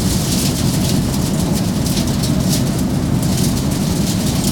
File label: road stones; AOS RF1 Kart Demo